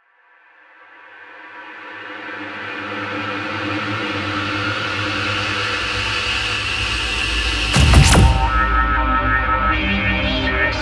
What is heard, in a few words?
promo pad